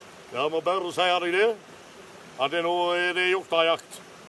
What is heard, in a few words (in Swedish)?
hjortajakt